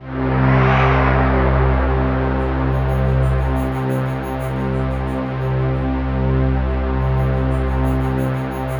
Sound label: WS SPARKLE; Synclavier Sampler Library; KEYS AND GUITARS